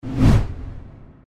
logo impact

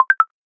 hint

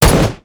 rifle; weapons

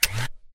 sand start

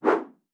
Whoo

